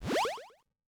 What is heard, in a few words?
Powerup; Cowboy; JotPK